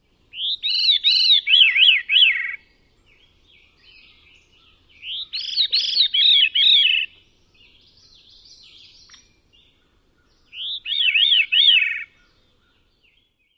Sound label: song, Veery